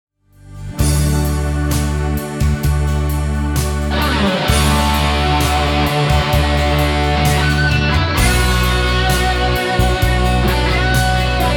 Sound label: SOLO, Boss GT-10